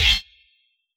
Hit, Yamaha DJX

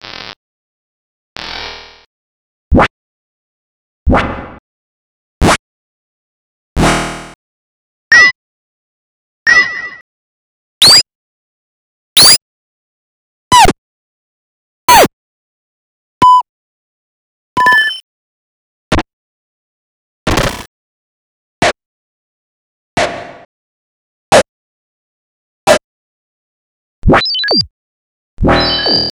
FX Percussion; X-Static Goldmine 5

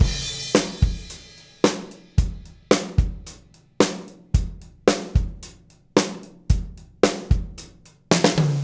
Beat Loops; Zero-G; Monster Beats

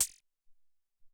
Syn Hat Cl, Alesis SR-18

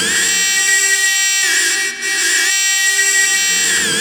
D tritone, distortorama; Spectrasonic Distorted Reality 1

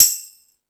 Discoball, drum machine

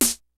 DANCE KIT, DRUMS; Kid Nepro; Elite

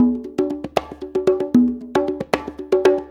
6-8 Congas, Perc Loops; Drum Tools

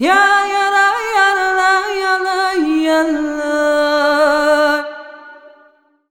VOX, Balkanish Traditions

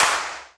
CL, claps; EastWest; Dance Mega Hip Hop Electro

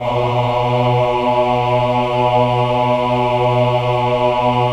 VIB B2, VIBRATO, Voices; Spectrasonics

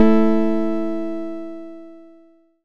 Hit, Stab & Hit; Bitkits

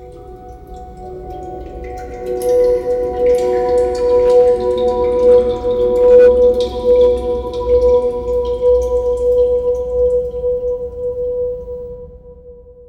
ambience

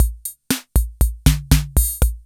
FILL, KR55; INIS - Funky Xtreams

